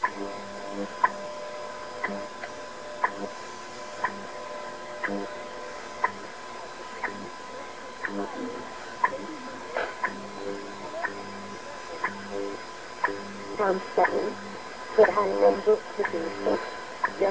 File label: 10MHz; BPM